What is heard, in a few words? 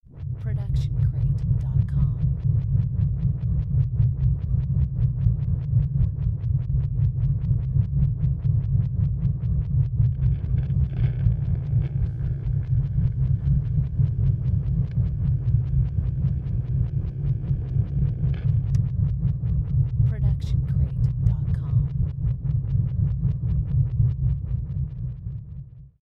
SciFi Hum Loop; SoundsCrate